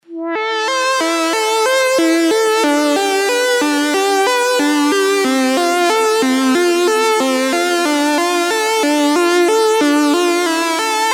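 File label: lead, Speed Boat Theme; synth wafers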